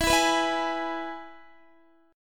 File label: E-Major E, Guitar; strummed; JGuitar